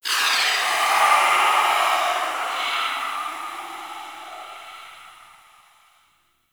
Steam Release; Warframe; Corpus Gas City; Ambience

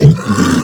Flying Mouth Pain; Warframe; ManInTheWall

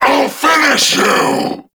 Vocal Attack; Warframe; Duviri; Dax

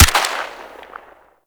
Shot, weapons; Warframe; Tenno; Dex Furis

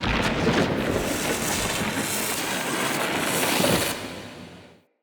Tesla Charge; Encounters; Ode Pollution Tower

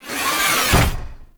Animal Foundry Pillar Lower; Liset Machines; Tenno

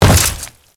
Grineer Step Goo, Footsteps; Warframe

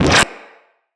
Magnetize Deflect; Warframes

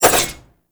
Spin Whoosh, Dual Daggers; Prime Okina

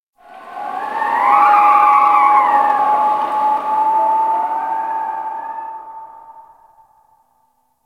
Cold Wind Whistle, Ice; Warframe; Corpus Ice Planet

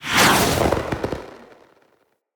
Blind Arrow Whiz By; Powers; Fey; Saboteur

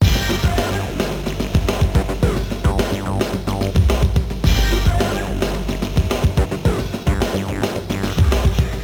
Music Mission Complete; Warframe; Caliber Chicks Minigame

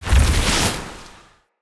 Stone Cast; Fey; Ward

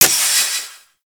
Heat Gun Mech; Warframe; Grineer

